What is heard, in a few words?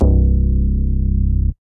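Bass House; Ableton; Skitter and Step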